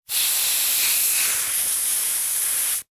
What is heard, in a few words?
Industry Tool Air Compressor Blow Air Slow